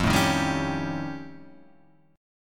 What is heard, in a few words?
F Augmented 9th, Guitar, Drop D; 3,0,1,0,2,3